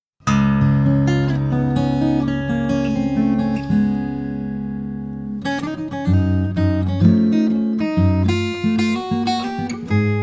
Hawaiian Guitarist

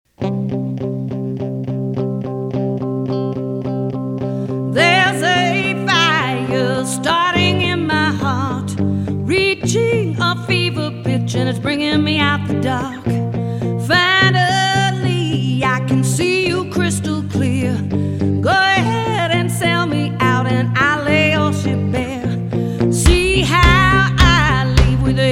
Variety Band

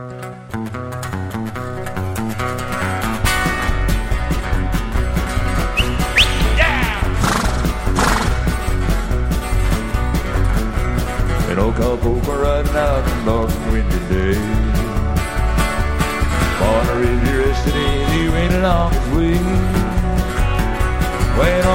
Country Singer

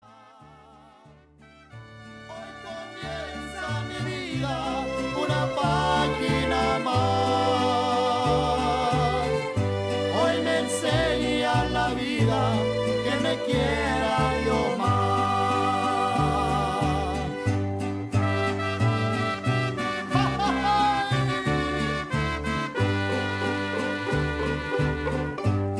Mariachi; Austin; This Austin Mariachi group is comprised of musicians from San Antonio, Laredo, Odessa, and Austin

